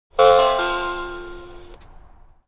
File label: chime up, train; OpenBVE